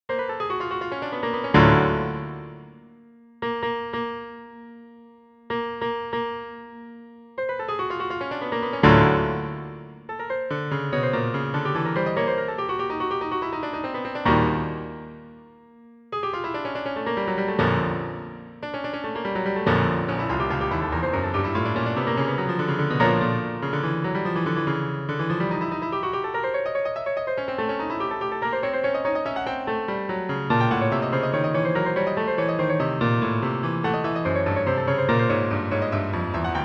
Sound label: Stuck Notes